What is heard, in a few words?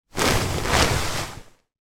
household movements on bed with mattress protector on